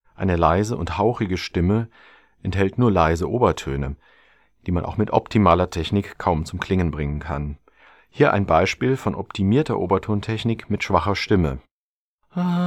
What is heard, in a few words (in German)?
L Technik mit Knutterstimme, Oberton Singen; Buch Oberton Singen CD